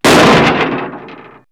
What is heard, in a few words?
Destruction, perc fx; X-Static Goldmine